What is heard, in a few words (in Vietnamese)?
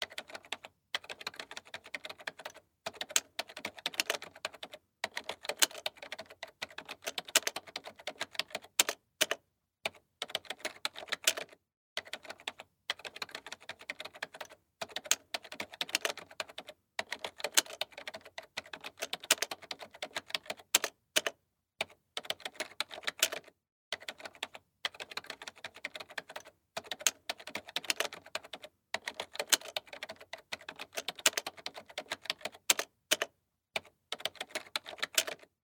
Tieng go ban phim cham